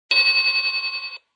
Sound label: Toy bell goes ding.; Children (Sound Effects:Human)